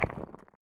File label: Vent Move Ground; Among Us